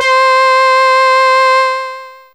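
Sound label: Brass Ensemble C4, Fairlight CMI IIe; Brass1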